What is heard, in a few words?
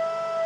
pump; rigs of rods